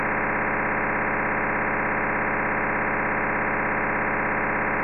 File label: signal